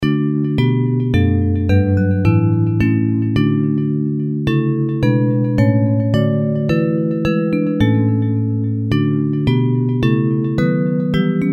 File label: Bells, Traditional hymn; Depth of Mercy